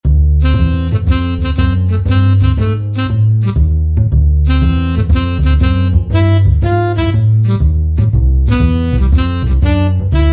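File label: Acoustic Bass & Sax, Korg TR-Rack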